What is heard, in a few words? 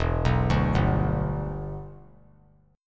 E Minor 7th, down; arpeggiated